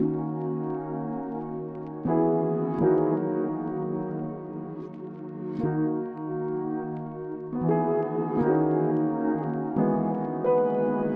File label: dm7 emaj 86bpm